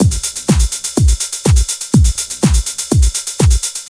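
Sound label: drum loops, right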